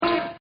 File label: BEEP COME; Beeps; Objects